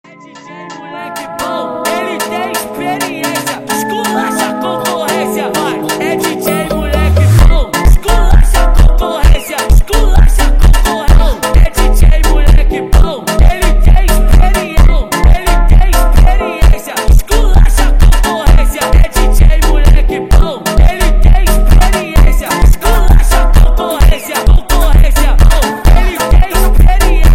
Phonk funk

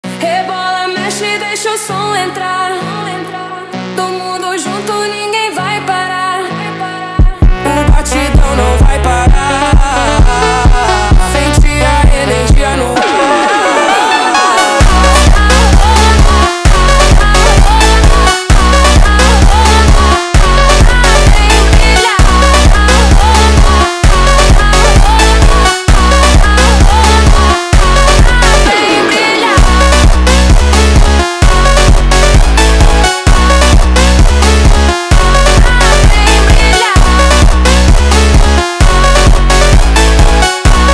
Phonk

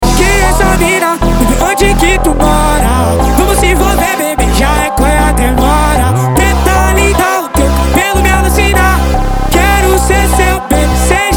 Phonk Slowed

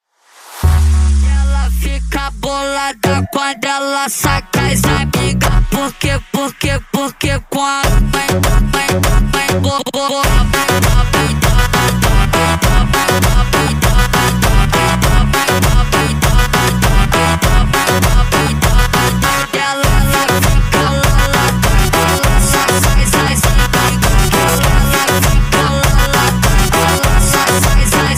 Phonk MONTAGEM

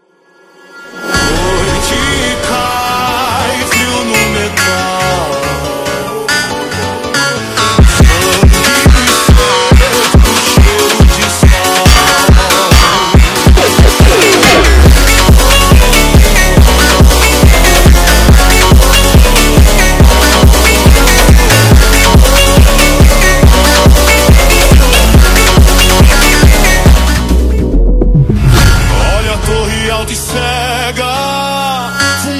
Phonk

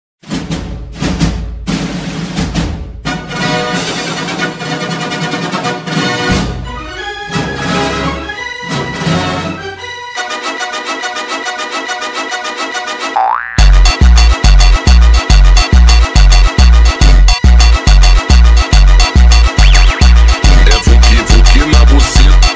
Phonk funk

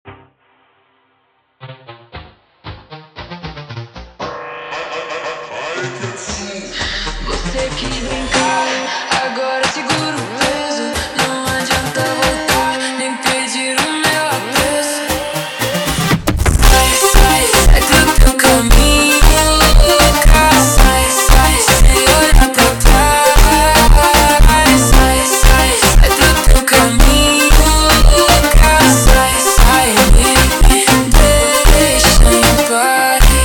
Phonk Slowed

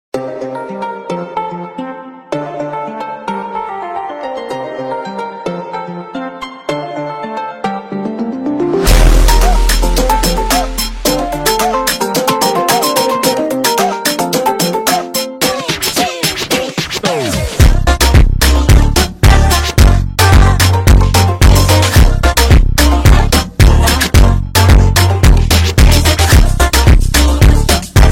Phonk Funk

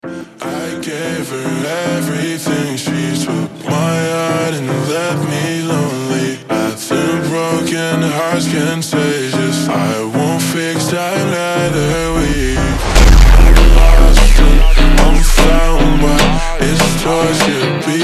Phonk Ultra Slowed

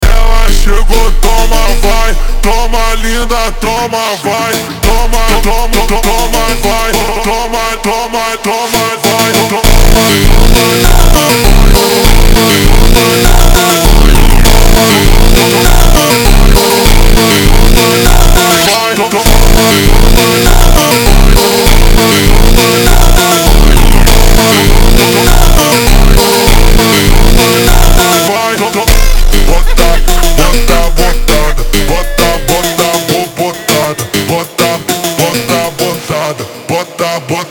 Phonk MONTAGEM Ultra Slowed

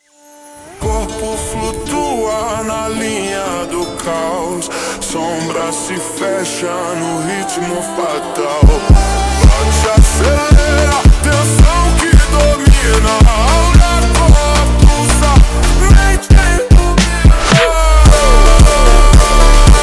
Phonk, Slowed

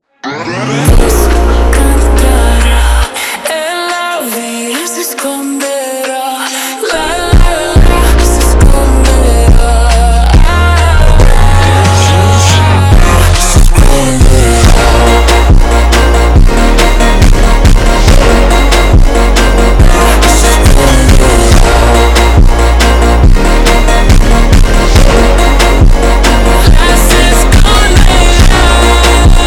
Phonk, Slowed

